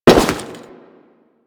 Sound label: Crewman Step Default, Footsteps; Warframe; Corpus